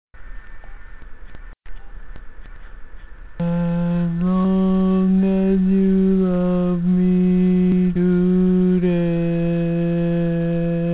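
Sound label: Baritone, barbershop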